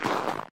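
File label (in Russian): тяжелый шаг в валенке по снегу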